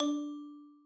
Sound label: reward